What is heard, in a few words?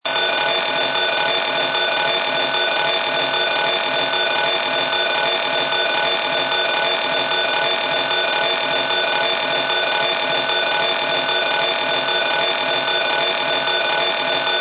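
Bell Continuous General Alarm; IMO; PAGASYS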